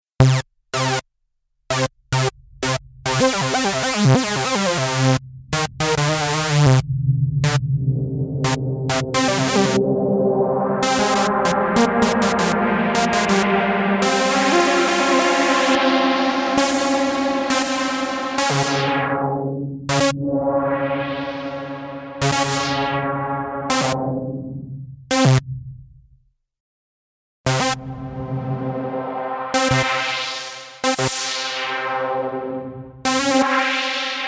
filtre dans reverb

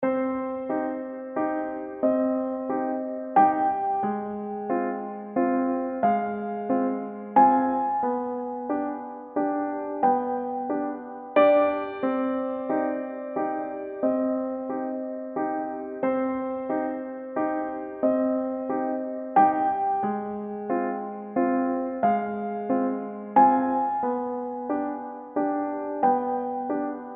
retard; notes etrangeres

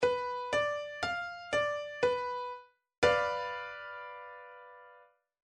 degré VII, accords degrés tonalité mineure

